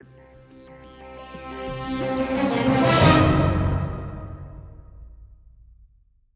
Stinger; Mass Effect; Matriarch Benezia

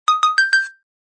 Unpause; The Fancy Pants Adventure World 2 online gamerip 2008

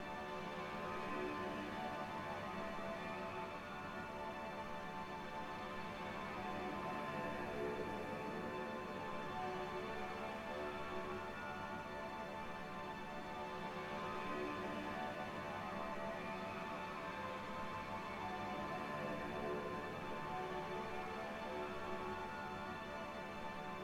New Dream Drone

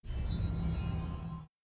Level up; Idle Wizard

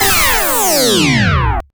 Game Start; Eliminate Down; Genesis

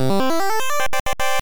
Level Up

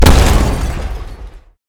Collision Person Hard

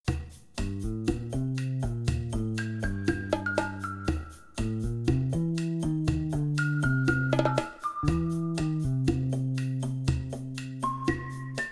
Background version loop; Koa and the Five Pirates of Mara; Paco Mitos Hollidays

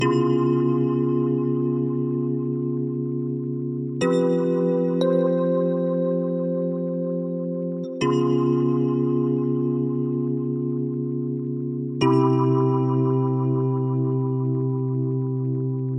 rhodes; Toki Tori 2+; Toki Tori Collection

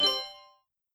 Instr T piano; Backpack Hero

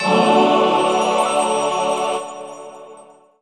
Jingle Caught Fish Large; AR Games

